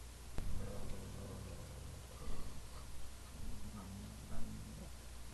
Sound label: growling, wolfdog